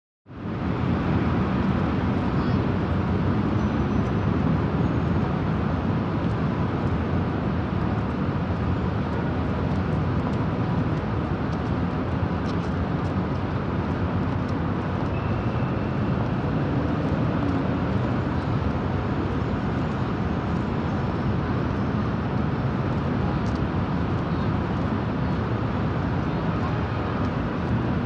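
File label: Park Atmos Late Afternoon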